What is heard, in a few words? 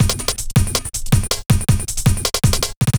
Old School Break, Drums